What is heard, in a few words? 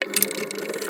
Enlarger Aim Clockwise